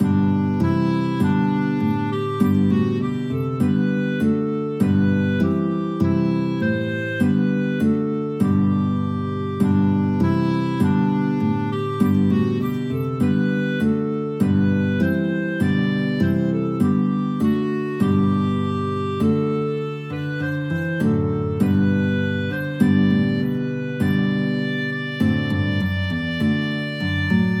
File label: cwm rhondda guitar clarinet, guitar clarinet duet